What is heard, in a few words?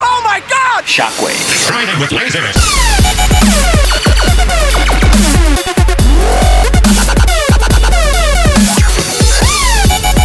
EDM